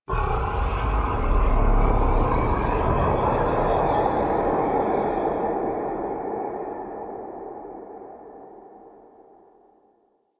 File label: Sky Breaths, Ambience; Warframe; Duviri